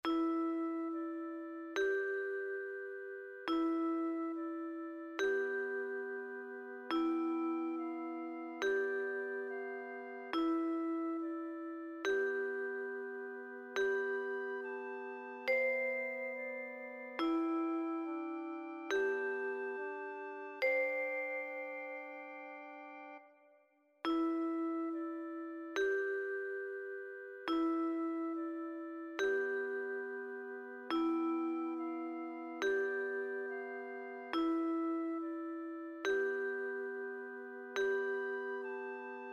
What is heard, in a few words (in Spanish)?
Quietud 2 liras